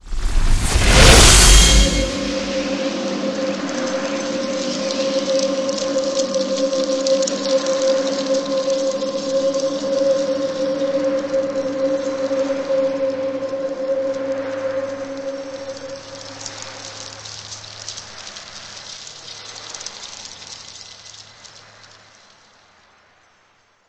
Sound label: wing gun loop